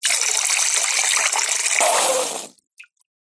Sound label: human spit water out into metal bowl raspy blow at end